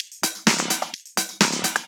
Electro Loop 128 BPM; Vengeance Samples

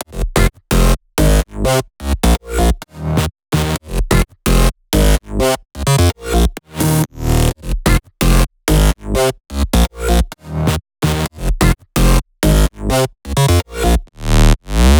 128BPM Melody Root F; Vengeance Samples; Neon Kit

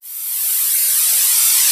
reverse, FX; Vengeance Samples

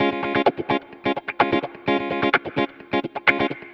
Electric Guitar Kit C# maj, 128BPM; Vengeance Samples; Special Sounds

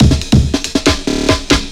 Fill 140 BPM

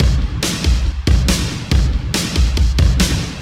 Loops BB 140, BreakBeats; Vengeance Samples

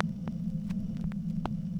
Record End, Vinyl Record; Record Noises

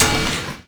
FX Impact; Vengeance Samples

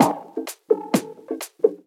VFX2 Loop 128 BPM

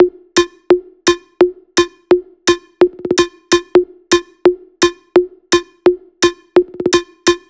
128BPM Tribal Kiss Kit

